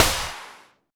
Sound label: Drums; Distored Reality